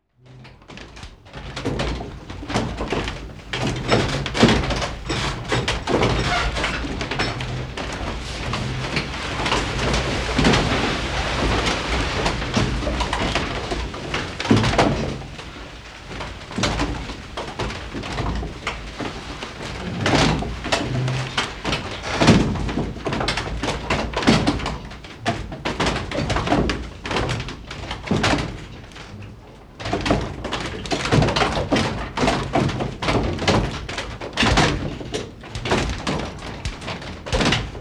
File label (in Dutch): molen drijfwerk